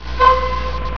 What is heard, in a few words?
tick